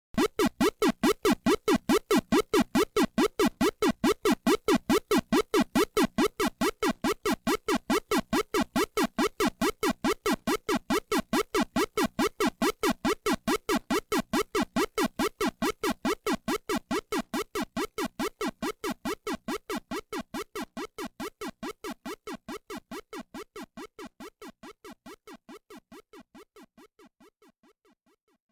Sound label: Start Button Waiting Sound; Pac-Mania Original Soundtrack 2024